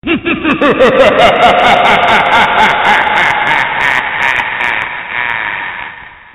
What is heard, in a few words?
Scary Laugh